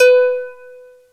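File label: SQUARE C5, K1 KAWAI; East West; Global Sounds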